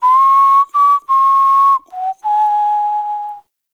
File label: Camp Whistle; Warframe; The New War; Drifter